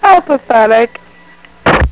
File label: phone slam